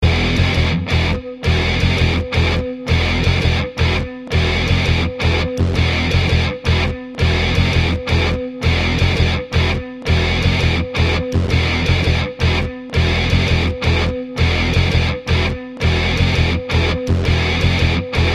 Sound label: Music bpm167